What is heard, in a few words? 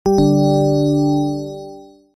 alert